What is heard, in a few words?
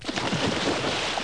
ocean default beep; 250 Desktop Themes